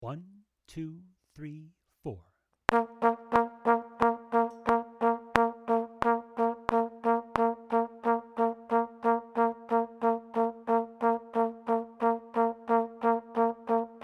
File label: Eighth notes at 90 with gap playing